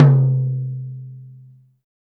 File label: Toms Real